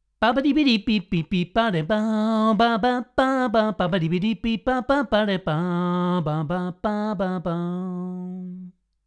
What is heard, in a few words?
Vox AT4080 mono